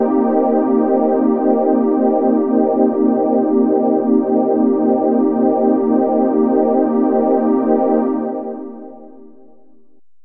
pad transcendent; Pads

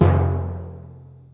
timpani lo; Western and Latin Percussion

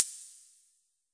long hat; DRUMS (SINGLE HITS); Hats

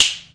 sharp hard clap, drums single hits; Claps

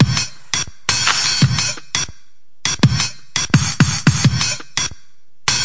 170 clangy sparse dnb, drum loops and breaks; 161 to 180 bpm

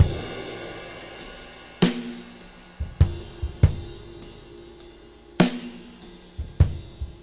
six eight Loop, 100 to 105 bpm; Six-Eight Loops

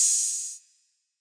Open Hat, Hip Hop Kit; STYLE KITS

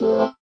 stabs hits

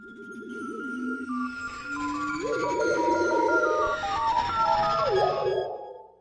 space science jungle wave, Atmospherics

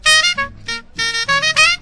sax; MELODIC SAMPLES; HORNS SAX TRUMPET BRASS

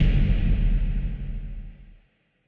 drop kick; Punches Hits Discoblasts

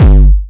Syn Dr, Overloaded Synthetic Drums; style kits

